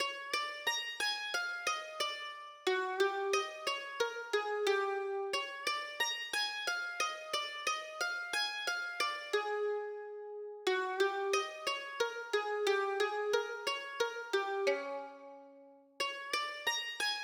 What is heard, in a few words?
Mixed Pentatonic Tune; Bb